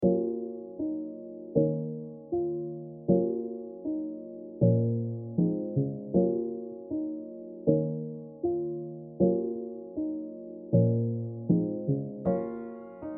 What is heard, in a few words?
F min 157 BPM Piano Keys